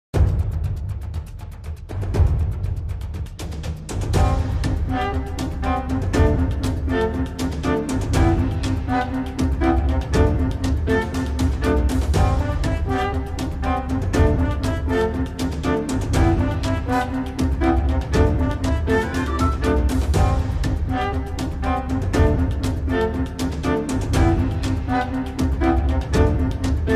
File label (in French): sonnerie; la france insoumise